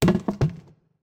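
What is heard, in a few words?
Collision Traffic Cone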